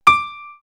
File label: House Piano; X-Static Goldmine; backtothe70's